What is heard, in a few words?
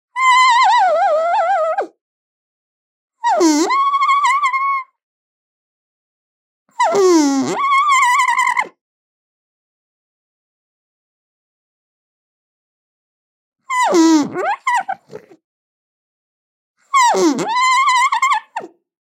alpaca grumbling